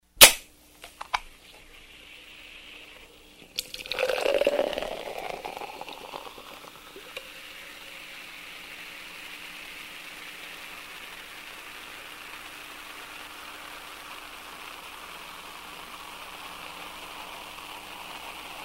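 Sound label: nalili shypuchuu gazirovku, poured fizzy soda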